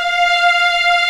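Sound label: F5 legato, violins; East West; The Ultimate String Collection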